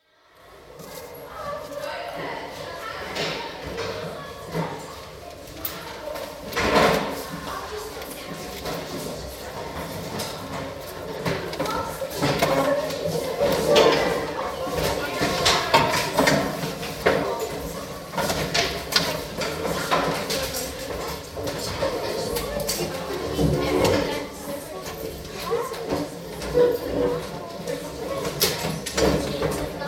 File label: Mixed Comprehensive School, classroom atmosphere, 2nd Year Pupils enter room, settle down, chatter & quiet periods while working, bell rung, chairs move; door banged; BBC